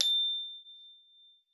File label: A5, GLOCKENSP S; Xsample VOL-4